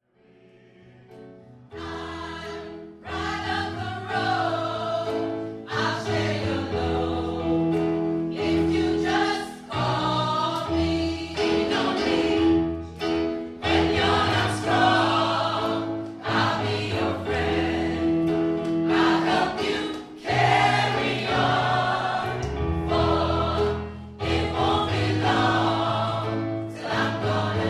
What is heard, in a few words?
choir